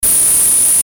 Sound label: static high; Static High Frequency; Production Element Imaging Element Accent Transition; Blastwave; Static Sound Effects